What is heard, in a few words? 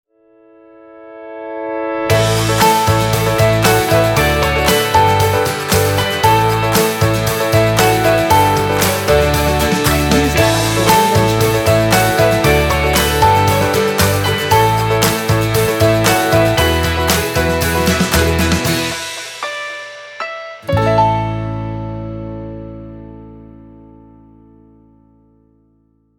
stinger